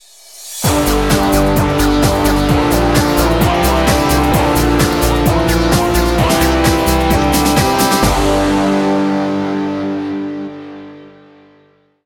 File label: Race Win; A.I.M. Racing